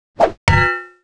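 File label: axe, monsters; quake2; coop; slaughter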